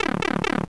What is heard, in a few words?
Power Down; SNES; SMALLSTARS